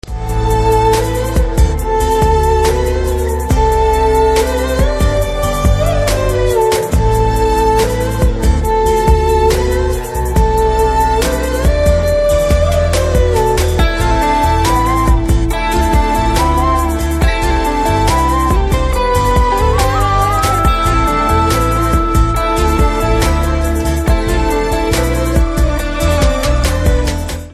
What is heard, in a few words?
Song BGM; Shanmukh Jaswanth